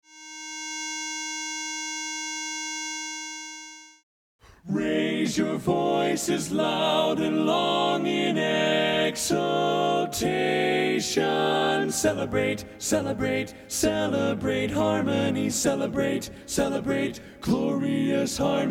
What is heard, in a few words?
Celebrate Harmony Tenor